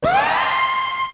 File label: Signal, Yamaha DD-35